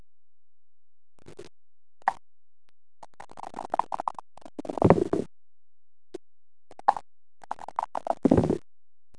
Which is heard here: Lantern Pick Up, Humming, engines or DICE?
DICE